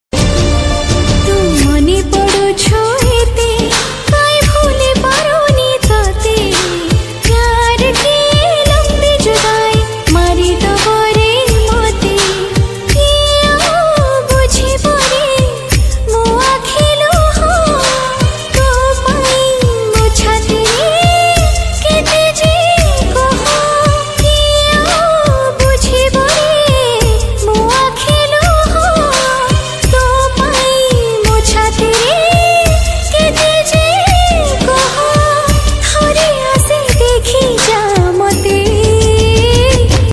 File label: Odia Romantic, Odia Love